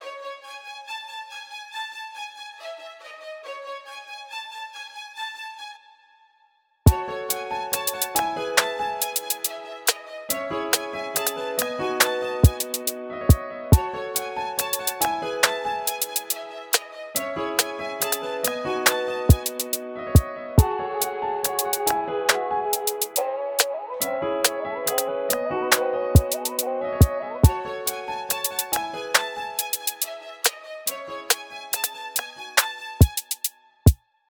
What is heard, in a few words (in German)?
Strings Klavier; Vorspann